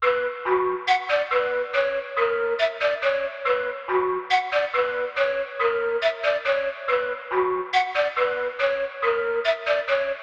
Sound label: 140bpm Bmin